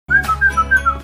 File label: whistling for pod dog